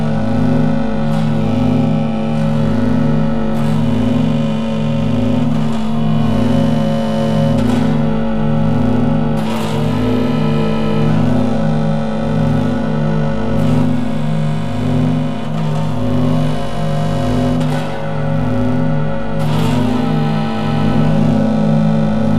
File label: Conveyor Lights; Titanfall 2; Boomtown